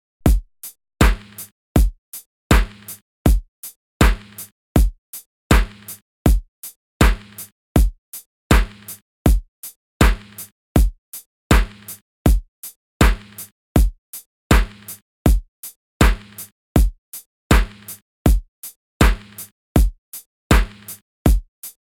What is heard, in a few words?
Beat SLOW